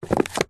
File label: foley raffle ticket books wads set down on top each other; zapsplat